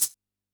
shake; Yamaha DD-65; PERC

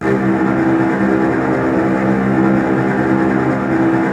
STR TREMOLO, Strings

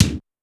Kick, Pitched Up; Clavia DDrum-AT

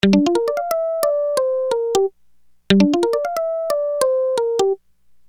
Keyboard

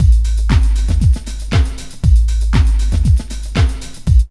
DISCO LOOP; Zero-G; Funky Elements

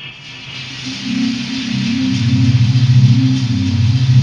FLUTTERERS; Pro-Rec - Synthsonic

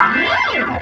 Organ Lick; Ambient Grooves; Organs Licks

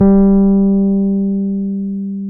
PNO, H.Pianet; Roland LCDP10 Keys of the 60s and 70s 2

